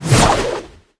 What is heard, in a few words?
caltrops